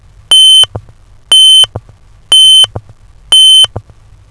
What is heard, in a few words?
tone